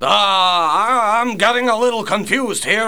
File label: CONFUSED